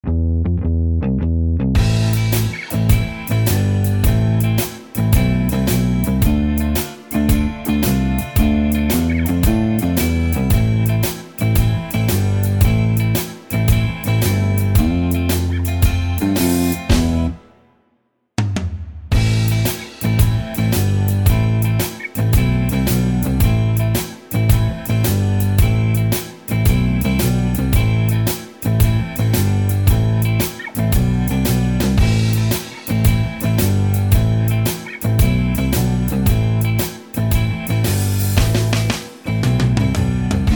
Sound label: BLUS; BULGARIAN